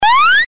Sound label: jump